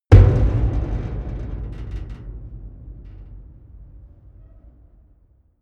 big metal impact hard punch

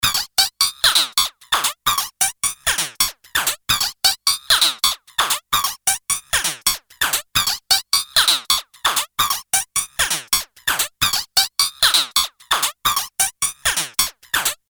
beat electro staccato classic

